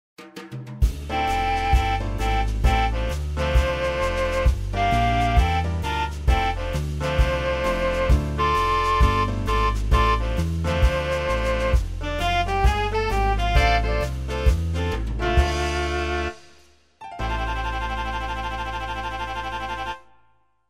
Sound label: Jazz